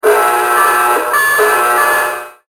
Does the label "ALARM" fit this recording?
Yes